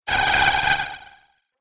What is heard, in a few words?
Alien Laser Blast Attack